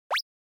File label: Anime Motion Fast Short